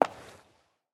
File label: footstep; Smite; Baron Samedi